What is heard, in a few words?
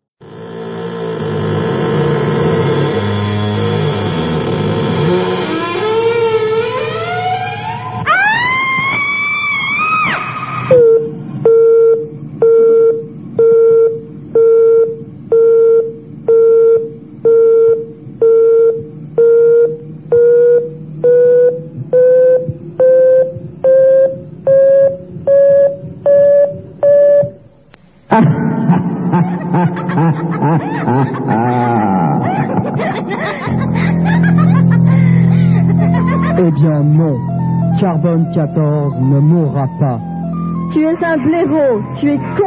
jingle